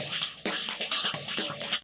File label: drums, Funk 131; F#min; Big Fish Audio; Hardcore Breakbeat Action; Funk Factory